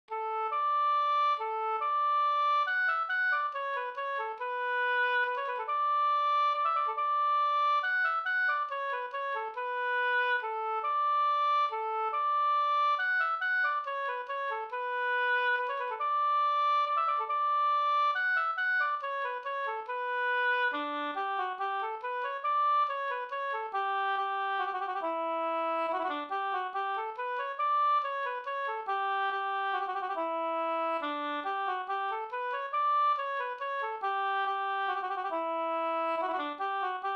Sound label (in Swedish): langdans; Lilla langdansen Bingsjo Da